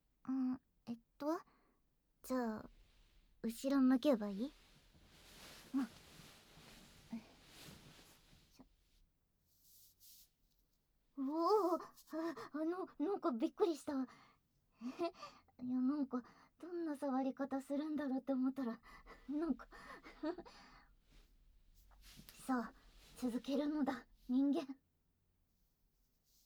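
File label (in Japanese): 簡易マッサージ／絶頂; ウサを目隠し; ウサ乳首を責める; 手マン; ゴムあり挿入; 正常位; 騎乗位; 潮吹き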